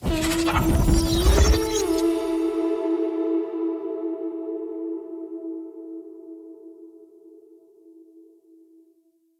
Armour Plating Cast; Powers; Bromius

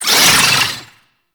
Brood Cast Vocal; Warframe; Oraxia

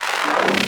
Terror Totem; Warframe; Necro

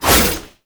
Melee Whoosh; Boss; Enemies; Abilities; Warframe; Oraxia

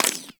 Bullet Impact Ricochet; Warframe

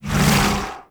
Slash Dash; Warframe; Excalibur Umbra

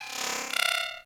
Beep Comby; Warframe; Corpus

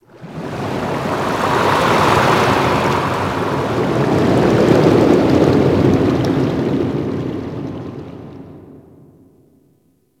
Ocean Deep Sea Bubbles; Warframe; Grineer Ocean

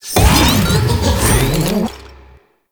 Balloon Mine Charge; Warframe; Follie Frame; Abilities

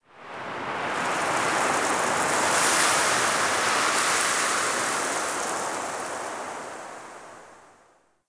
Sandy Wind; Ambience; Warframe; Man In The Wall

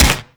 Infested Swarm Rifle 2D Shot

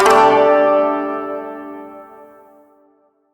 Shawzin Pent Maj Chord J; Chords; Warframe

